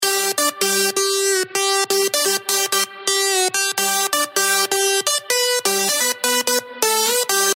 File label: Leads After Processing